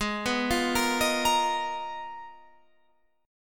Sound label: G sharp Minor 6th Add 9th G sharp Guitar Open F, chord; G#m6add9 arpeggiated; 20,18,17,17,18,17